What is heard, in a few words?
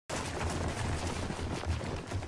run; StarEscort; qiyu